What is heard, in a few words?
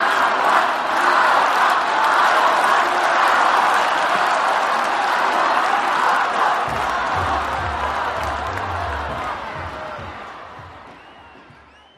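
Crowd Large Cheer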